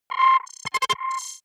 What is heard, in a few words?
button, signale; soundpool